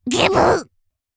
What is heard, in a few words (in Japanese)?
殴られる